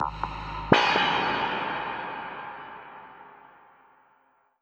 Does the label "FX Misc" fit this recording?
Yes